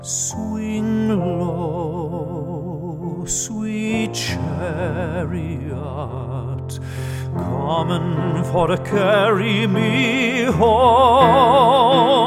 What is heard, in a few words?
Swing Low Sweet Chariot; Songs Of Hope